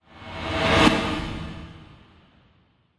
Lo-fi transition low passer by whoosh

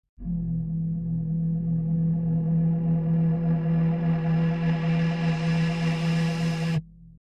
Horror accent low tension

